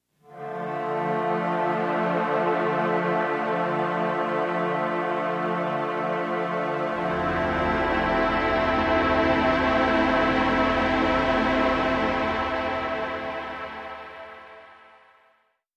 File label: Big Chords; Beds